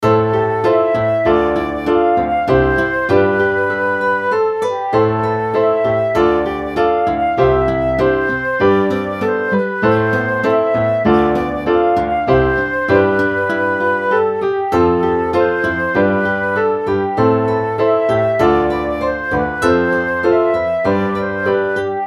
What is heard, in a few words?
background music